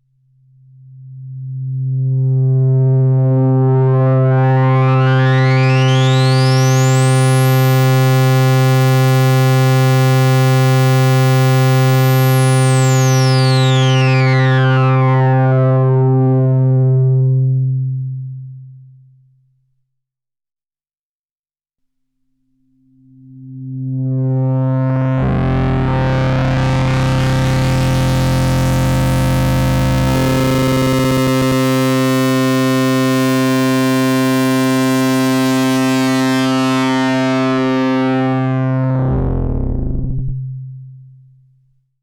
Filter Sweep Resonance Feedback 100

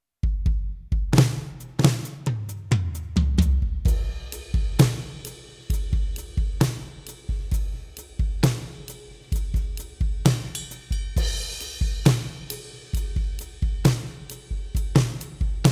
PEARL eMERGE Power Ballad

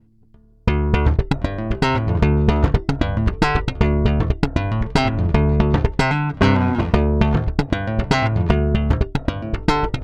Neckthru Slap Thump DI

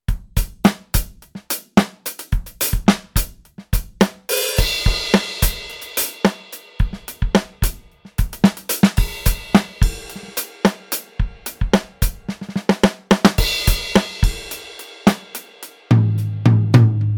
Kit NeoClassic; EFNOTE5

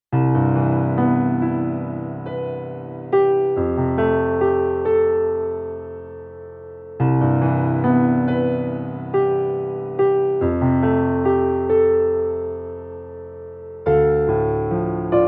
Dexibell Vivo SX7 Vivo Grand

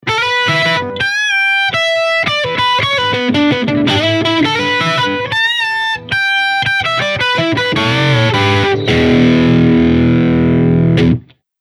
Engl RB 100 Lead Low Gain Contour

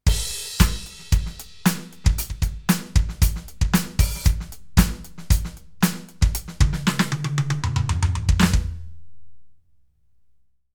Roland TD07 Fat Drums

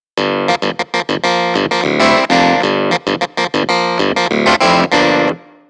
Yamaha Clav

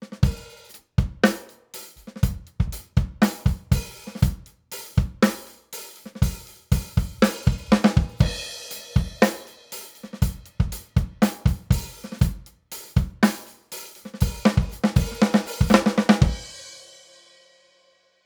Drums; ohne ORCA BAY

